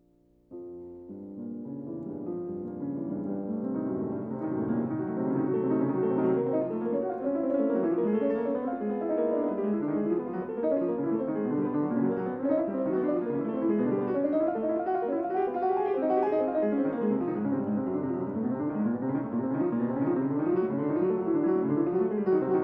Piano Sonata No.2 in B flat minor, Finale (Presto); Classical; Chopin